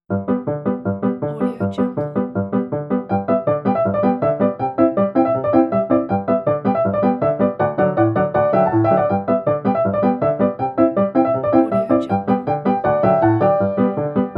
ragtime piano; red pepper music

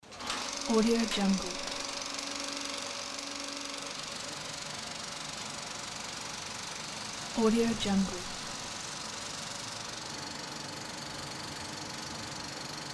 old film projector; lodosound

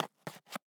cigarette pack close; prosoundfx

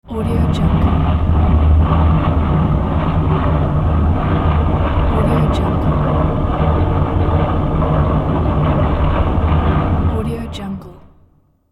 vortex turning; hollywoodedge

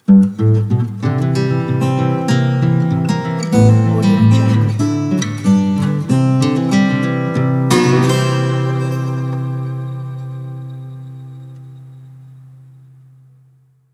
folk country acoustic guitar logo

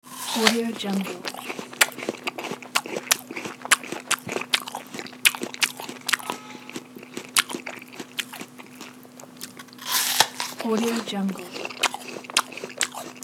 eating apple

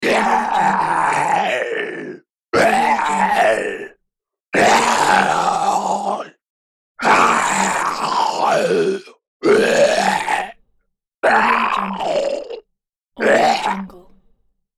zombie; 81inc

